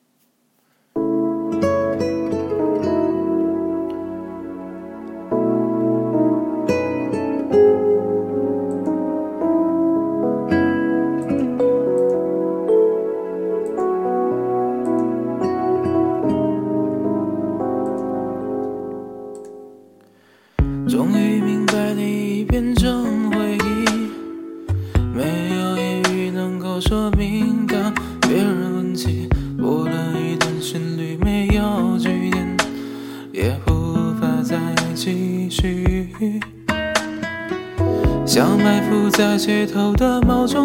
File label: Melody Cover